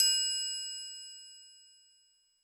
Roland U220 Electric Set, Drum Machines

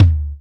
tom, dance drums

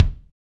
BD, KIT Real; 90 s Sample CDs; Sound & Vision - Gigapack I CD 1 (Roland); Real-Kit s 6

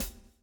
swing

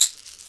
CAXIXI; Percussion; InVision Interactive; Percussion Slam; ZOMBIE

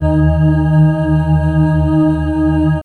Tibetan Glass G, Drum & Bass Pads; Total Drum&Bass; 90 s Sample CDs

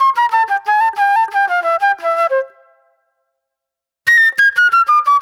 J-Funk Flute, Call And Response

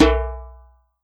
MIXED DRUMS; Best Service ProSamples vol.52 - World Instruments 2; AKAI

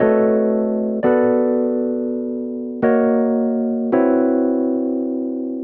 El Piano 85 F#, jazz keys, Chordy Loops, 85bpm

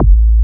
A-BASS, AN BASS; AKAI S-Series CD-ROM Sound Library VOL-7

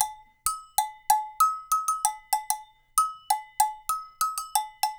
Percussionworks

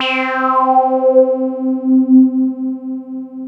FUNK; CMP - Analogon

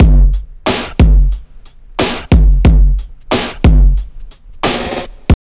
TRIP 90, trip loop

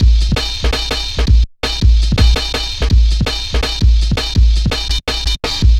Klanga, drum loops 165.5; Zero-G Jungle Warfare 1, 2 & 3; 90 s Sample CDs

